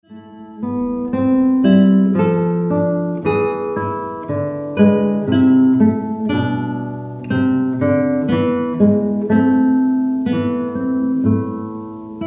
Sarabande ceremony piece, Flamenco Spanish Guitar Duo